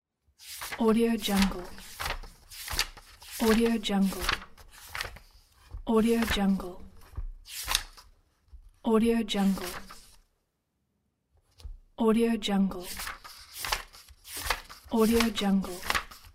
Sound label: turning pages of a book